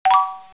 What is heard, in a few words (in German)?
dreh; Ultris